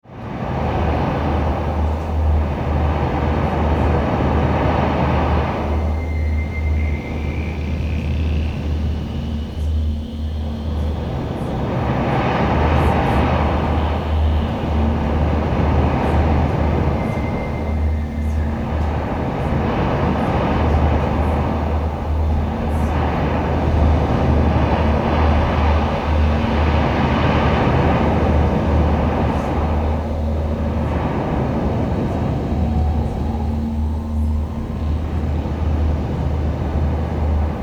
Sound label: Wind Monitor